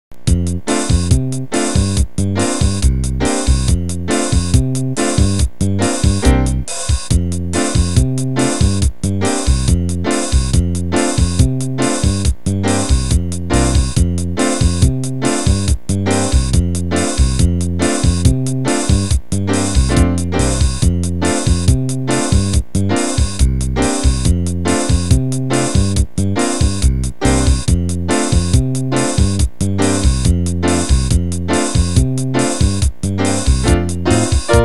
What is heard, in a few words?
samba melody